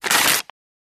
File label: Paper Rip Steady